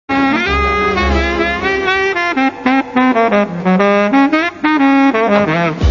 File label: solo